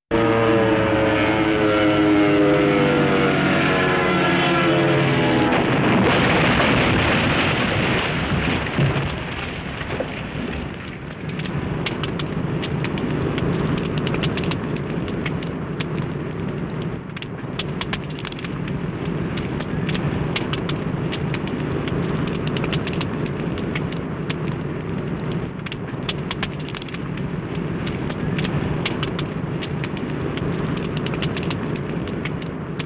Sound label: Fighter Jet Whirring and Crashed